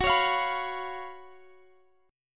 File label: Gb Major 7th Suspended 4th Gb Guitar Half Step Down